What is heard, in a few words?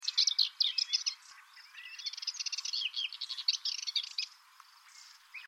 Sardinian Warbler